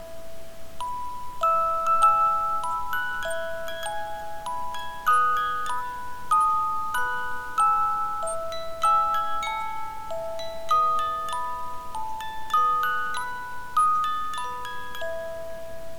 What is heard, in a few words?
O Tannenbaum, MusicBox